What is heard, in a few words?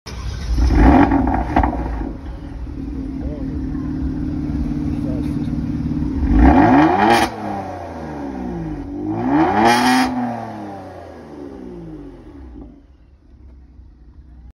Kia, اگزوز ماشین کیا